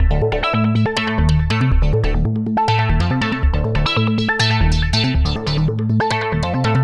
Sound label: Synth